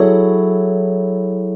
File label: CHORD, 150 BPM; Ueberschall; Drum & Bass Flash Trackz